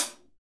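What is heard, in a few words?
hi-hat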